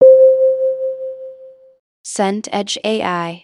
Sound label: rhodes note